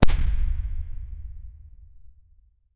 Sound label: sound effect of a single shotgun